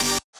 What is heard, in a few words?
wobble